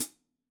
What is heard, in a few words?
hihat foot, gretsch kit